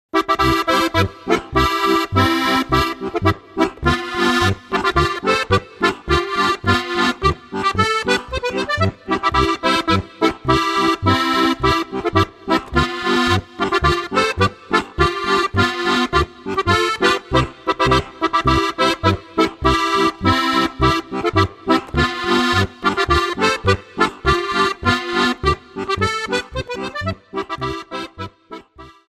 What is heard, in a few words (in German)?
polka; Von Bayern nach Tirol